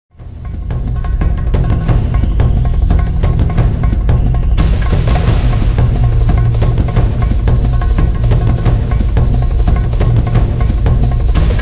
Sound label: drums track fast